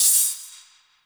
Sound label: Hihat Open